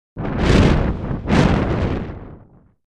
Flame in the wind; fire

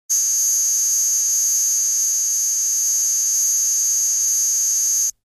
Electronic signal

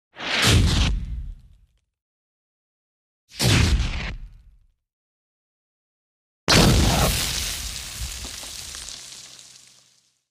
Energy blast; explosions

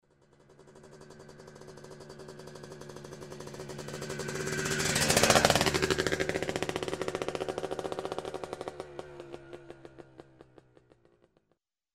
Motocross motorcycle passes by slowly; motorcycle racing

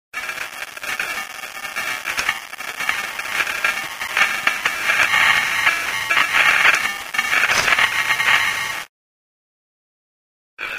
computer noise

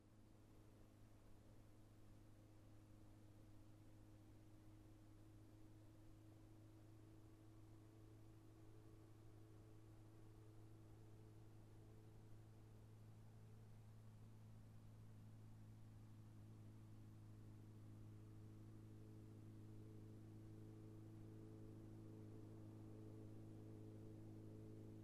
Motorcycle approaching, turning off

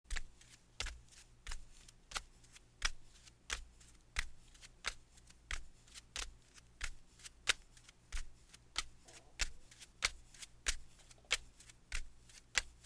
Barefoot steps on linoleum

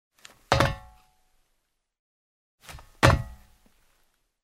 Jump on the metal floor with shoes; steps

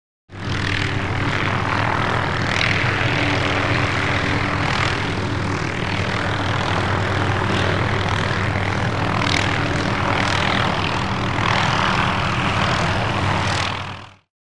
Helicopter in flight inside

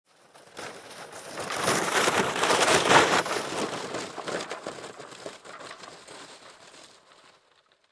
sledge is passing by; snow

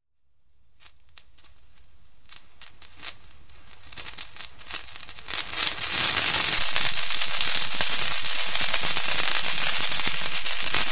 Pyrotechnics

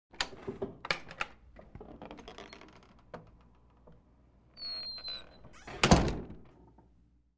wooden door opens and closes with creak

